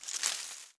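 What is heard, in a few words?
hit leaves; Computer Buyer 1997 August; Jack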